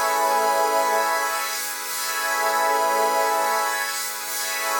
Pad Loop A, shimmer and sparkle; Pad Loops

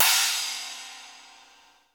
CYMB, DRY; Crypton - DrumBase5000; DRY KITS

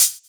Hhat, Hihat; Equipped Music; Loungin House

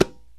PERC KALIMBA

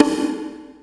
perc, Percussion; Trance; Drums; Trance Explosion Vol1

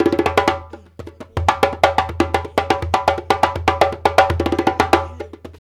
African Contemporary, Lamba, 120; Spectrasonics; Supreme Beats

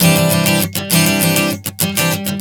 Strum 100 C, One Chord Strumming 100 bpm

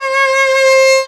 VIOL C#4, VIOLON, Arabian Traditions; USB Soundscan vol.43; AKAI

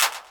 clap, Korg Electribe ER-1; Kit 20

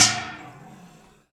TEK META, INDUSTRIAL; Roland - LA Composer vol. 1

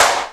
DR550 Clap, Boss DR-550 Drum Machines; 300 Drum Machines

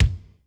ROOM K, ROOM KITS; Crypton; DrumBase5000

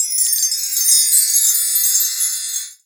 Chimes; musicradar; cartoon caper

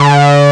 SYNCHRO; East West - Global Sounds; THE CAT

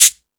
Beat Box, E-MU Pro-Cussion; drum machine

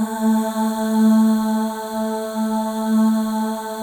Voices, FEM AHS; Spectrasonics; FEM.2 AHS

